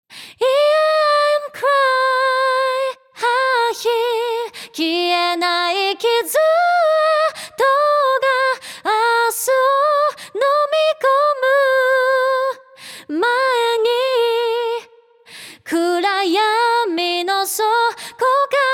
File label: falsetto